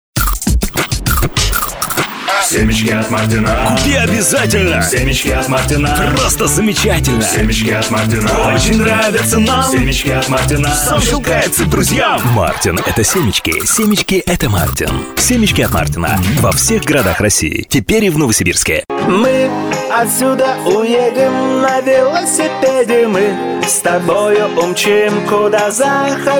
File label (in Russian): vokal